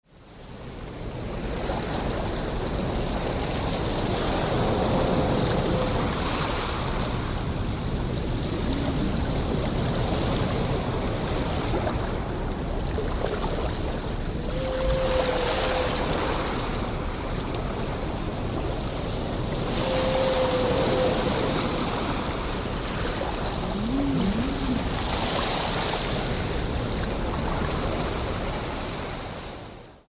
Ocean and Whales

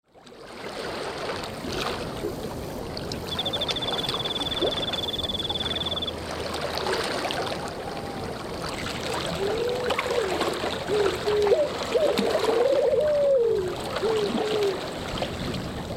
Lake at Dusk

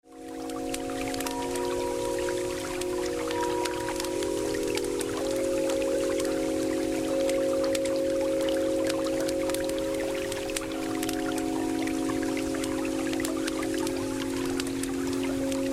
Sleepy Night with Bowls

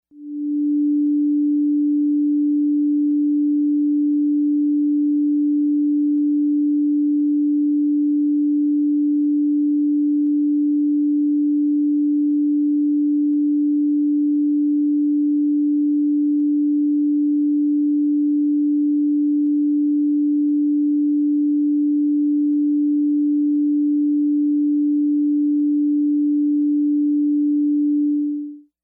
D Note; Sacral Chakra

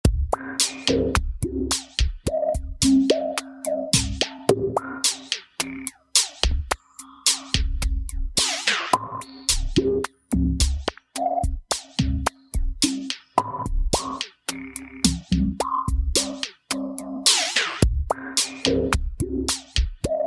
54 BPM

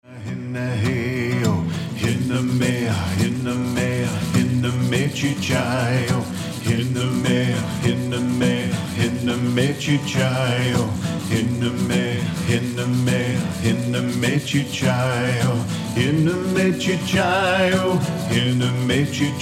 Apache Power Chant

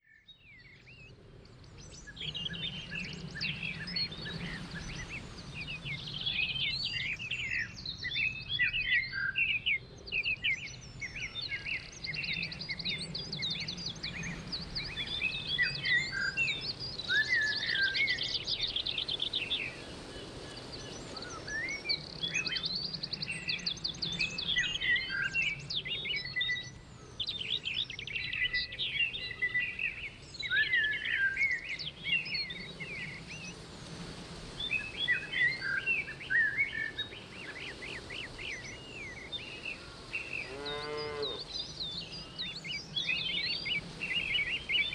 Breezy Morning in the Woods

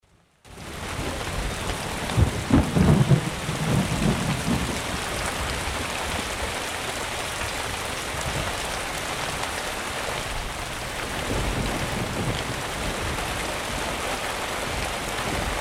Thunderstorm, Soundscapes

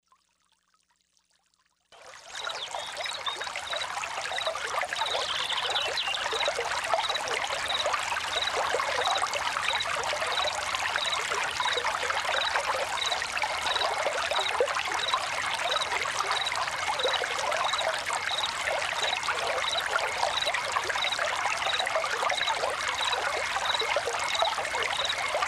Forest Brook Reverie

